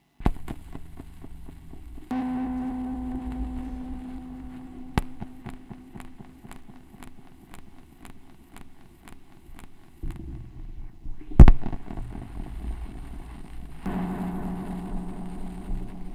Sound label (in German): schallplattengeraeusche, Vinyl